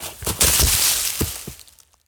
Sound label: Bodyfall Leaves; SFX Kit; Bodyfalls